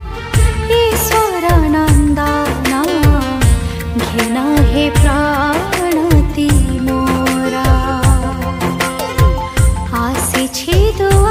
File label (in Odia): New Odia Christian Song Devotional